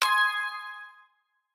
BGM BONUS MULT, SLOTS; FruitFiesta

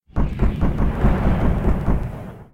bonus booster, slots; Wildlife Adventure

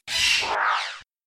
Bonus Bird Fly; slots; Pirate Quest

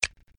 Button Next; St Paddys Day Blast

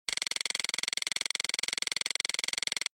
Spin, SLOTS; Pinata Loco

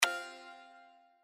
Regular Click; slots; Majestic India

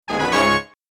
Winning; slots; Realm Saga

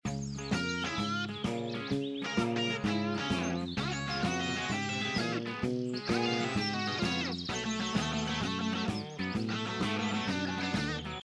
Bonus BGM; Big Paul

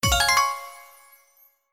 Symbol Scatter Win; Genies Wild

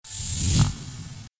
Exit Slides Animations; slots; Robot Vs Monster

